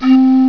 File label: Pan Flute (C3); Flashback